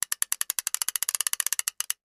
fish reel slow; fishing reel

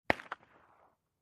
9mm Machine Gun

